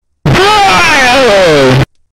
hit

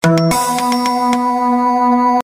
log on sound

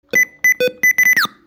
bip bip boup

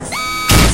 collar explosion

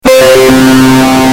windows 10 notification distorted

